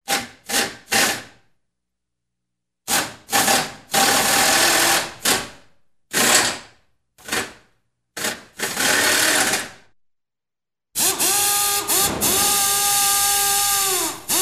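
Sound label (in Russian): пневматический гайковерт с небольшой нагрузкой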